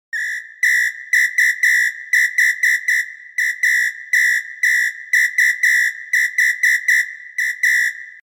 Whistle

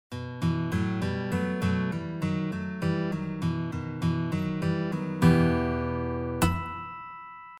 Acoustic Guitar

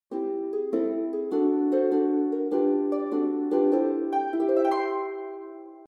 Zither

